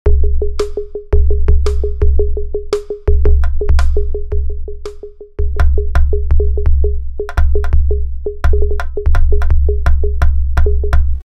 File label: Staccato Simple Drum And Bass Beat; KI MIDI Generatoren